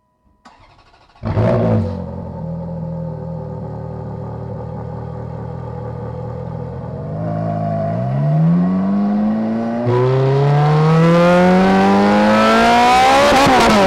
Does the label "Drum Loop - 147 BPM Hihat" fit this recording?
No